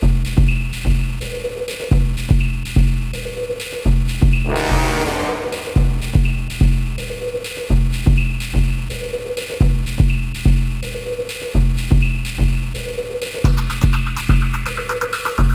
The Dirt Suspense; Scourge Outbreak

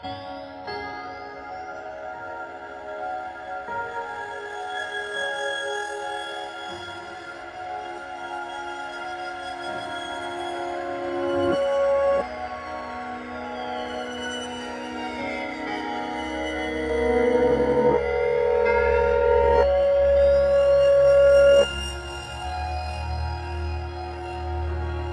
Hospital Room Twisted Loop

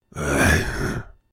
Cultist Notice Noise; SFX

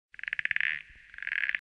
frog; Joan of Arc

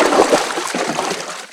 Footstep water Heavy

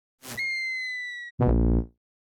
Birdfall

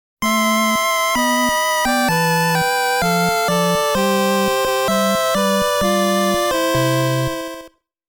Ending; Game Sound Museum Namcot; Mappy; Mappy Land; Mappy Kids

